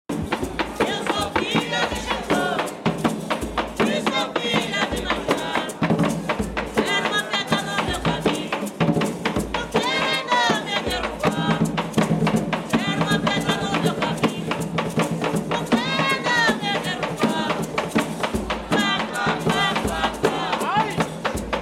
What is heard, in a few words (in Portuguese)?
batuque de umbigada, tiete; puseram uma pedra